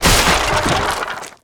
objects, World of Tanks

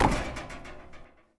landing metal; Escape from Tarkov

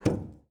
Aircrafts Cockpits Stick Roll Lim; DCS

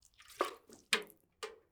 waterbucket throw; Rust

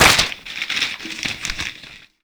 paper bullet impact; Rust

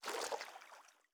footstep water light; Rust

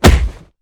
Inf Weapons Foley; Red Orchestra Ostfront 41-45

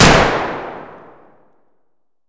m1a fire indoor distant; Escape from Tarkov

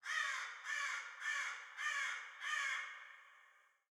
ambient winter birds

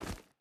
footstep rubber boots stones run; Rust

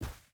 footstep rubber boots snow run; Rust

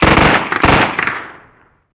gun miniuzi med

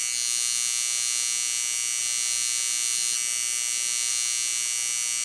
ambience electric buzz glitchy; Rust

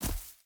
footstep boots grass jump land; Rust

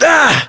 pain

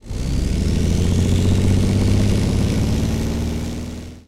leo2a5 engine load, engines tanks; War Thunder

